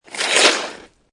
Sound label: Snow And Ice Ice Scrape Downhill; Weather